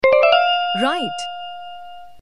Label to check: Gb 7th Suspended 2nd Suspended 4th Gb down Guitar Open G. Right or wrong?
Wrong